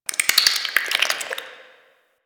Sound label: Flesh Tunnel Critter, Ambience